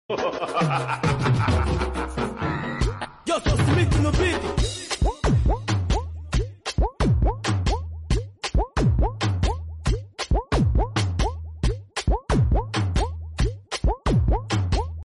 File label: group dance